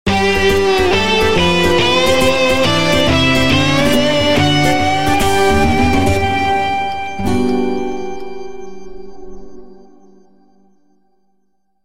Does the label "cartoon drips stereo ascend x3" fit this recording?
No